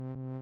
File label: toot